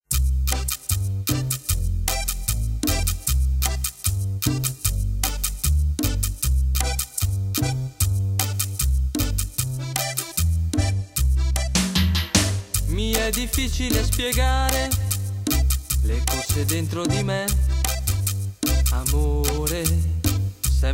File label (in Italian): cumbia